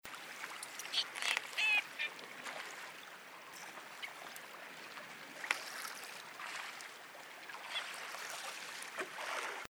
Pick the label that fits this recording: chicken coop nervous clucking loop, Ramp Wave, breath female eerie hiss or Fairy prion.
Fairy prion